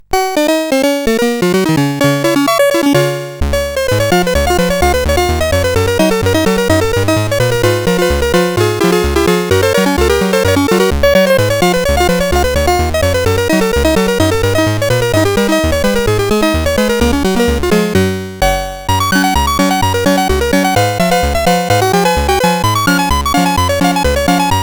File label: Ragtime, CSG8580R5